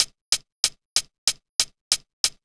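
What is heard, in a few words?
94 Drum Sound, Pure Electro; USB Soundscan vol.02; Underground Hip Hop; AKAI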